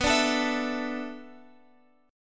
B Minor 11th B down Guitar Open F; strummed

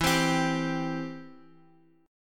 E Minor Sharp 5th E down Guitar Open D

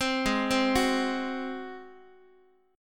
Ab 7th Ab down Guitar; Ab7 arpeggiated; JGuitar

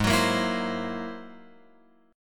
G sharp Augmented 9th G sharp Guitar Open F; G#+9 strummed; x,3,6,5,7,7; JGuitar

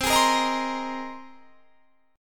C Minor 7th Sharp 5th C; Cm7#5 strummed

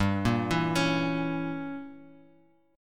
G Diminished 7th, Bass Standard; arpeggiated; JGuitar

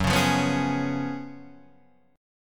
F sharp Major 7th Suspended 2nd Suspended 4th; F#M7sus2sus4 Chord {2 4 3 4 2 4} strummed

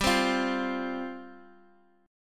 G 7th Suspended 4th G down

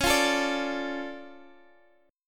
D Minor Major 7th Db

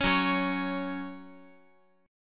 Gb 5th Gb down Guitar; Gb5 strummed; JGuitar

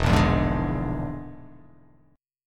E 7th Flat 5th, E7b5 chord; strummed

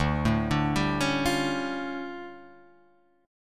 D Minor Major 11th, down; DmM11; arpeggiated

